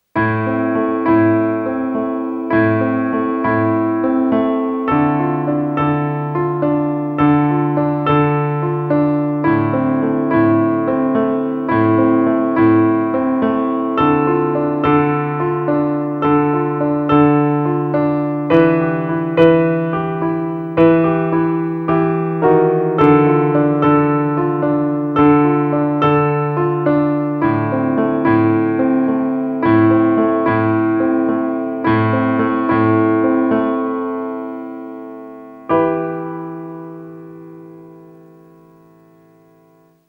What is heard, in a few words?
solo piano